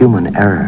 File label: Human Error